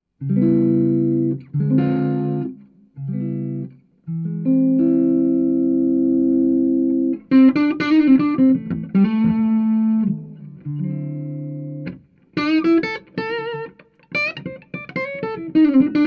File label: hydra crunch